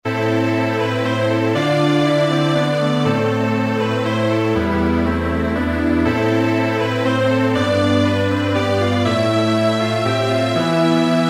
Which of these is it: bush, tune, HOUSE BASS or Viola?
Viola